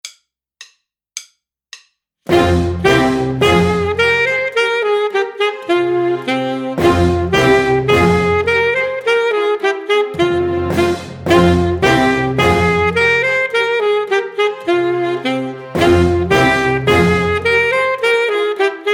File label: Duo In G Minor Student Sax